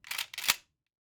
Shotgun Racking; SoundsCrate

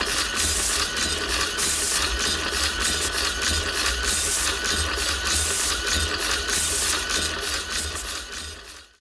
hydraulic loop; soundscrate

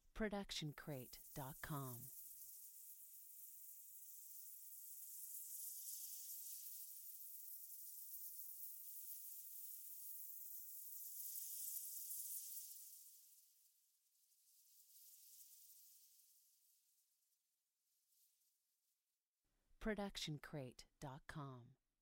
Magic Portal; SoundsCrate

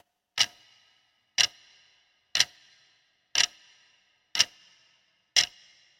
clock; soundscrate

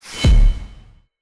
Epic Metal Hit; soundscrate

